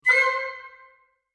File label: woodwind single grace note